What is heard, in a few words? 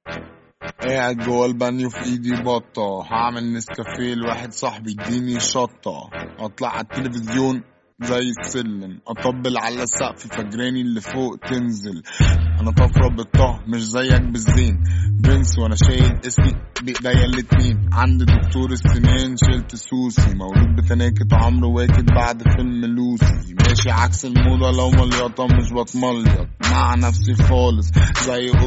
arabic hip hop